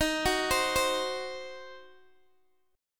Eb Minor 6th, Guitar DADGAD; Ebm6 arpeggiated

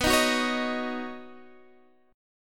B Major Add 9th, B, down, Guitar Drop D

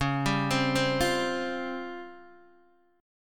C sharp Major 7th Flat 5th Guitar DADGAD

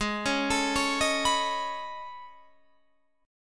A Major Sharp 11th, G sharp

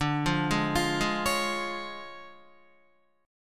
D Minor Major 7th Flat 5th, D, Guitar; Listen to DmM7b5 arpeggiated; JGuitar

